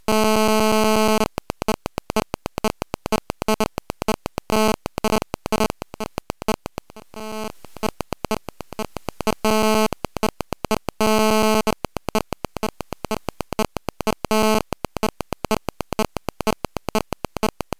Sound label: GSM DTX UL